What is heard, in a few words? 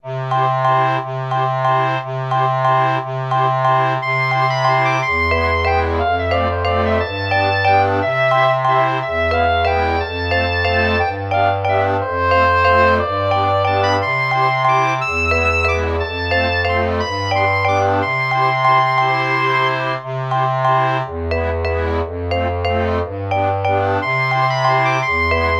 Balloon Bgm; Loving Deads The House of the Dead EX